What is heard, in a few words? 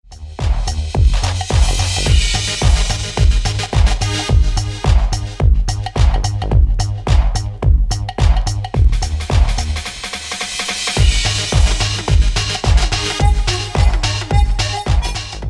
Beat